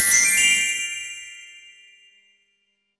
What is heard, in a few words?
win; tourismustal